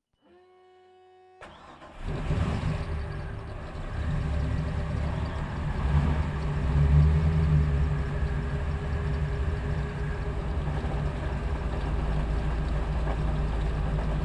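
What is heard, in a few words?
cigarette top gun onboard start steady driving low to medium high stop engine front; engine front left; Sonniss GDC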